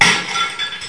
Geschirr kaputt; Skat 3.0

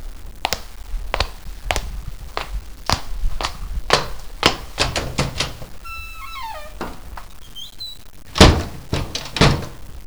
TUER

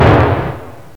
treffer; subshot

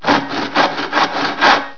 saegen

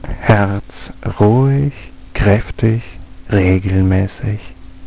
Herz ruhig kräftig regelmäßig; Autohypnosis; Autogenes Training

